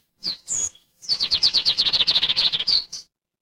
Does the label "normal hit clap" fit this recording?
No